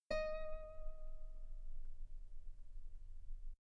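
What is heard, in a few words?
guitar D sharp 4 very long piano normal; bananenkeyboard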